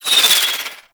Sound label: Bow Arrow Rain Whizz By; Ultimate Abilities; Madurai; Warframe; Focus